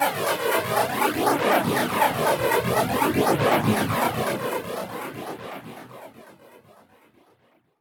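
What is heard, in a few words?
Modulation Proc, alarm siren samples, 120bpm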